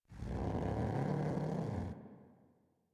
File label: Kavat Vocal Idle; Warframe; Khora